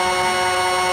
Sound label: minigun spin; weapons